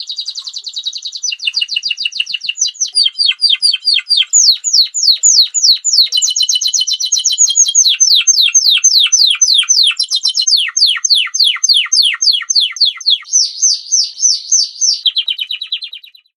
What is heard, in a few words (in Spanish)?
Estridencias; Αρνητικές νότες του τιμπράδο; timbrado español